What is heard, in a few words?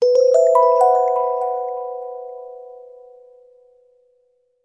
Music Box Chime Short